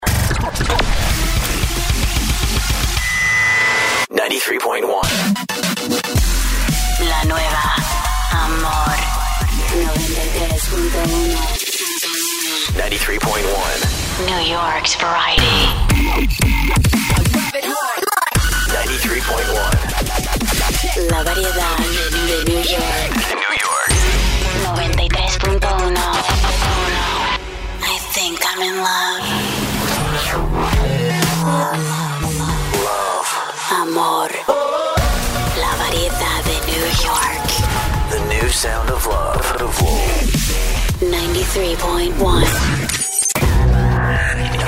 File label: Top 40 Bilingual Heavy Beat Imaging